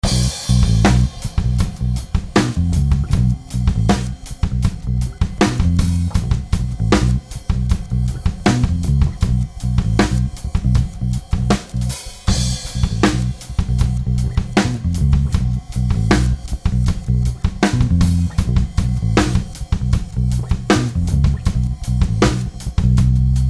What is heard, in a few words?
Beat Loop Drum Bass Funk Rock Reverse Cymbal Groove